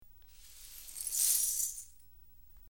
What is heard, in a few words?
Light Sweeping Up Gla, cleaning, sweeping